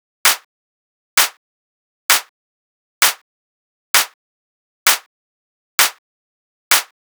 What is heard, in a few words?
Clap Loop 130, Techno